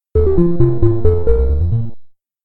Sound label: Level Start Theme